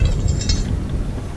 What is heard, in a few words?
fan